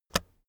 keyboard external space release, computer; kommunikation

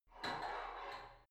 barbell heavy plate pull out reverby; sport freizeit; fitnessstudio

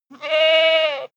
goat baa high male; natur tiere; ziegen

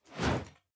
whoosh classic

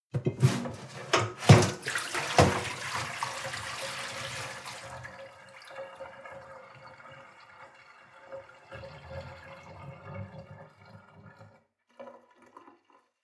sink water pot pour out

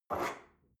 espresso grab from table medium, restaurant cafe

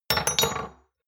shot glasses stack hard; soundpool

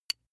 small metal impact soft damped; industrie wirtschaft; metall

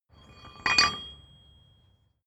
metal drawbar handling; industrie-wirtschaft

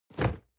cardboard box medium fall low rustle; objekte

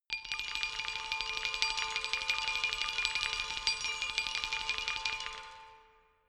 glockenspiel mysterious tremolo low; percussion

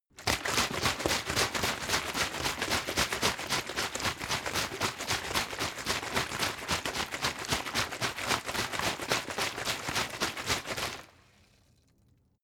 bottle water sparse shake; gastronomie; berufe

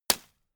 gun shot silencer

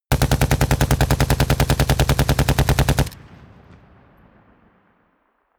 rifle ak-47 exterior fire automatic big; schusswaffen

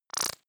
splutter short, zaps buzzes

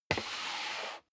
step leather shoes wood creak slide long; schritte

